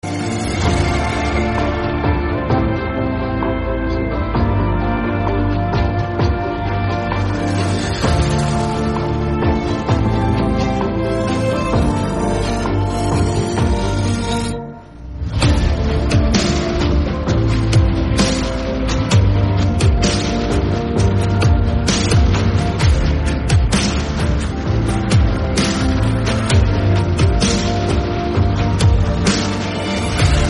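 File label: instrumental background music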